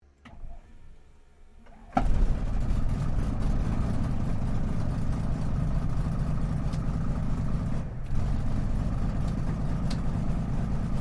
Aircraft CT4A Startup Interior, CT4 military training aircraft; Airplanes (Sound Effects:Vehicles)